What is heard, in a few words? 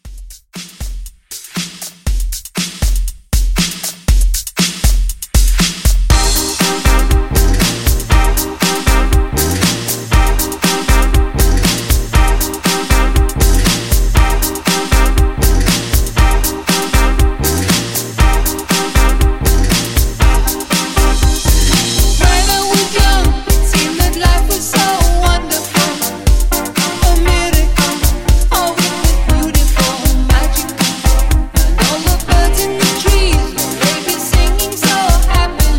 70s Classic Rock Re Drum 119